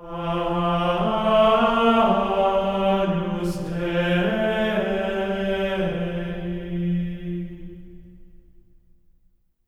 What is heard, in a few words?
AGNUS F4, Symphony of Voices; Spectrasonics; GR AGNUS